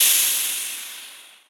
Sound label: steam hiss